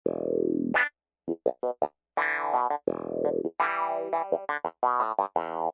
Wah Clav; Funk & Rock; Loopology; Get Dirty

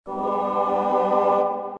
Mellotron Choir G, Techno & Dance; Loopology; Crying & Dancing

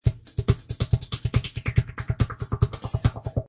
Ska Drum; Lounge & Reggae; Loopology; Ska Till Ya Drah

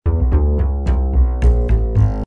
Bow Fidl E, Rockabilly

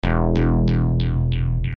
Moog Bass E, Techno & Dance; Loopology; Crying & Dancing

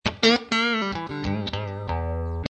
Smack Funk Wah; Funk & Rock; Loopology; Get Dirty

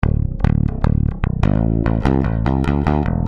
Dirty Bass D, Heavy Modern Funk & Rock; Loopology

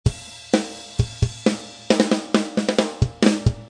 Dirty Rock, Heavy Modern Funk & Rock; Loopology

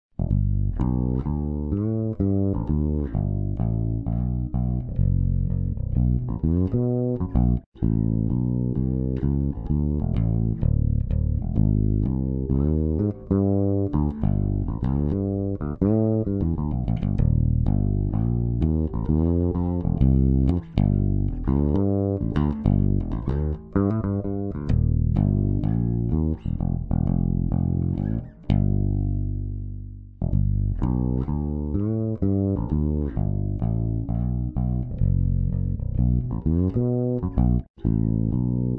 Fretless; Surround Session

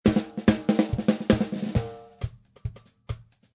Ska Drum; Lounge & Reggae; Loopology; Ska Till Ya Drah

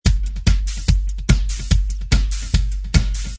Pump Beat Sub, Techno & Dance; Loopology; Pumpin

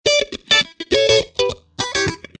Funky Phased Gtr; Funk & Rock; Loopology; Get Dirty

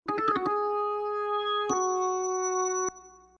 Ska Organ Lead C# B min; Lounge & Reggae; Loopology; Ska Till Ya Drah

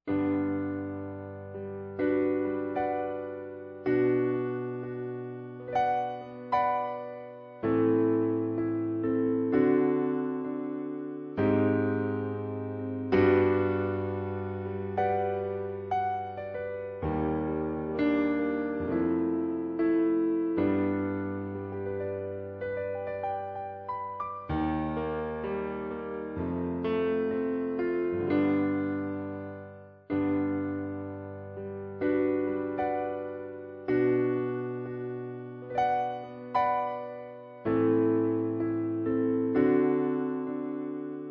Piano Comping, Martini Lounge; Surround Session

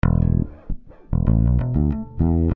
Smack Funk Fretless; Funk & Rock; Loopology; Get Dirty

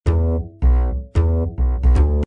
Bow Fidl E, Rockabilly

